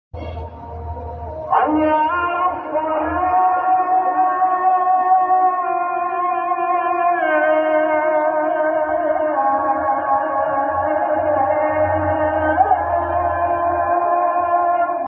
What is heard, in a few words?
Azaan islamic ringtone